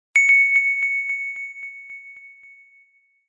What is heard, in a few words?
bling